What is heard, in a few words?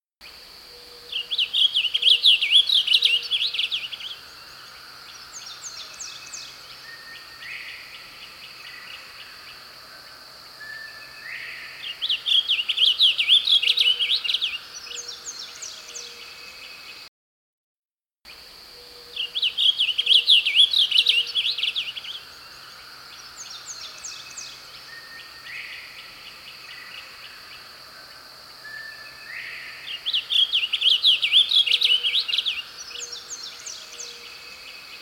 Blue Grosbeak Passerina caerulea